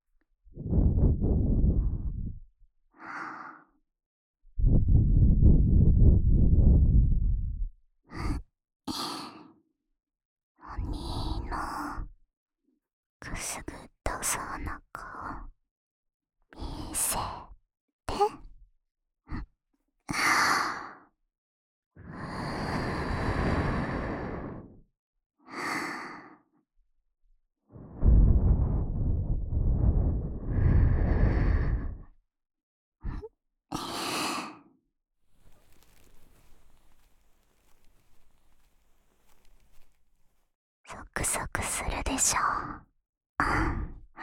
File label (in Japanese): お耳 ふーふー, ASMR